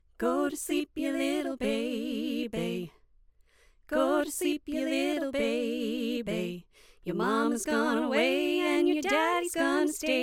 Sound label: Go To Sleep Harmonies